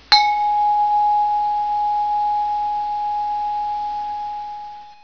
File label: long chimes